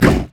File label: ancient golem effort; LoL; monsters